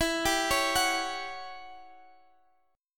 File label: E Minor 6th Add 9th, Guitar, Standard; Em6add9 arpeggiated; JGuitar